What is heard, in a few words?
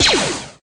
bullet sw blaster; minecraft